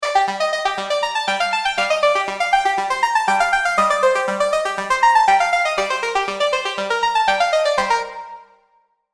vital presets; chiqui audio; vital plucks presets

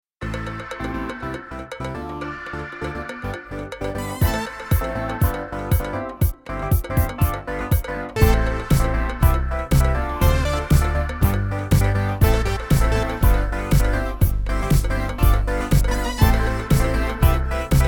Disco; rhino electro

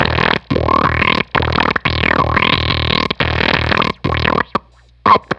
Fuzztest drum

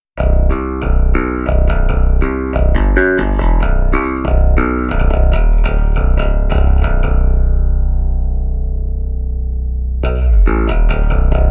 bass; avedis mutter monolith; polarity audio

